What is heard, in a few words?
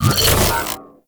Shockwave Moa Leap; Warframe; Venus; Venus Terra Shockwave Moa; Abilities